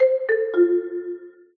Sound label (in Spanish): ventana pierde